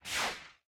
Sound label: flash